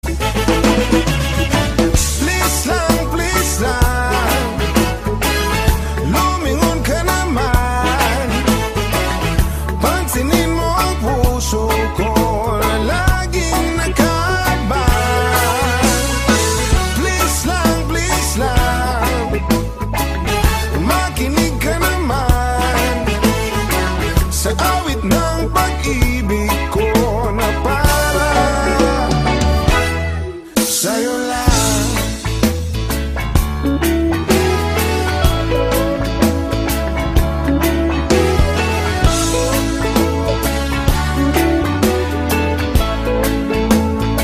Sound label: Reggae